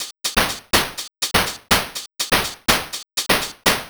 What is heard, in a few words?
123 Lo Pressing Perc, Drum Loops; 123bpm; Nu Dance Electro